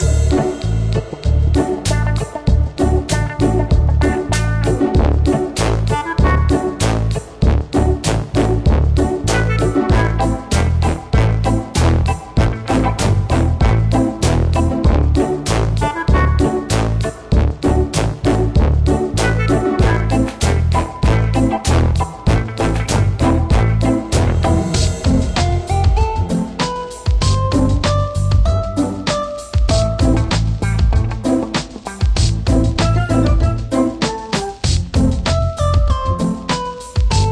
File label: Music Menu; pudding monsters